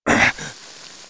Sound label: pl burn pain, player